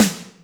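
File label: Snare Biggr Box, Alesis D4 drum machine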